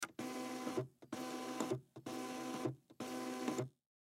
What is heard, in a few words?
Label Printer; Label Printer Industrial Feed Several Sheets; Printers; Office; Blastwave